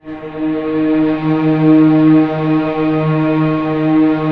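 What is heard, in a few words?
strings D#4 PP R; Sound On Sound - Peter Siedleczek's Orchestra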